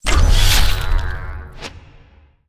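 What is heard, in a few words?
ui battle start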